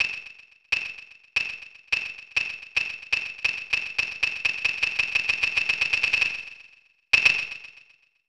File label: Rhythm Tree; Hanjuku Hero; snes